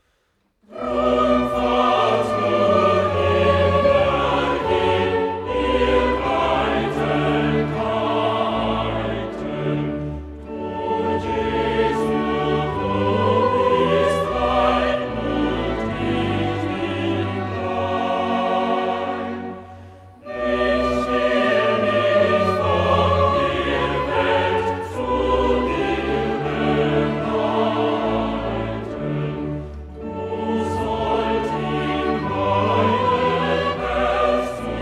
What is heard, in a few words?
Chorale; Bach C123